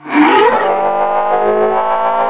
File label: roller charge; hl2